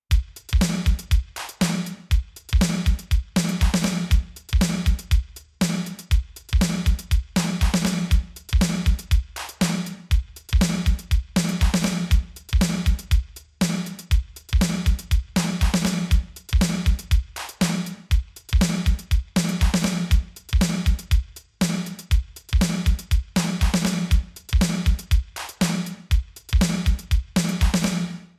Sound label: 80S WET